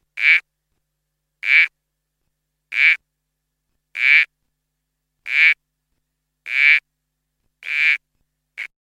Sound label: Uperoleia leavigata (Smooth Toadlet)